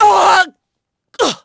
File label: DEATH, VOICE; Pulse Racer